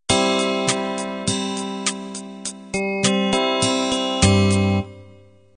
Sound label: pop ballad intro